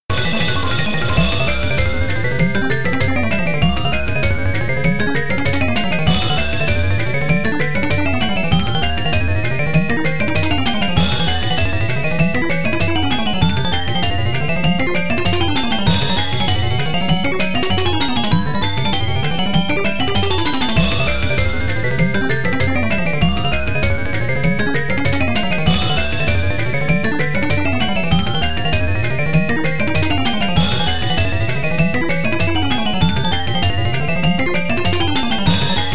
Warning BGM; arcade; Pop n Bounce

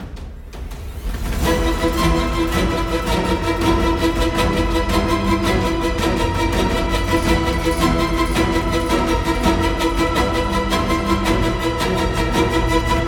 BGM combat mob enemy; Babylon's Fall

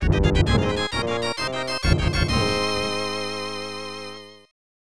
Victory!; Secret of the Stars; snes

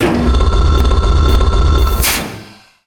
Triple Stone Door Open; Ruins

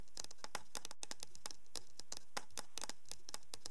spider buddy loop

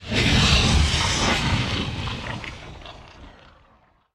lava burst; ice age scrats nutty adventure; Level4 Hidden World

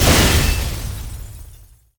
Bomb Explode; gamerip; bejeweled 2 deluxe

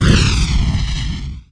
Fireball; lego ninjago rise of the snakes

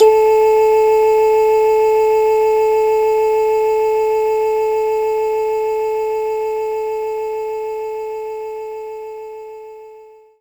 Voice Oohs B2; knights try

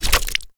Swamp Jumper Hit By Axe; we are the dwarves gamerip 2016

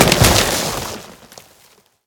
ice smash; Scrat

